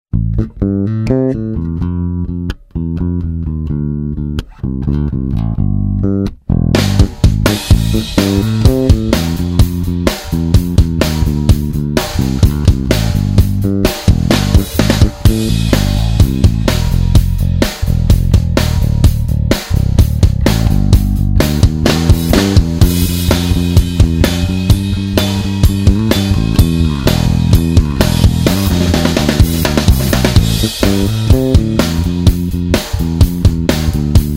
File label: fingerfunk rounds, lakland